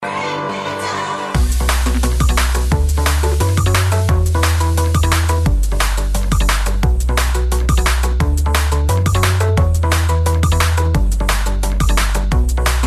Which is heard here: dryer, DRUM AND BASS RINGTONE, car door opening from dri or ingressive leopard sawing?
DRUM AND BASS RINGTONE